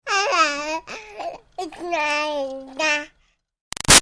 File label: Baby 6 Month Old Talk Short Answer; Babies; Voice